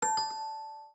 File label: alarm; LINE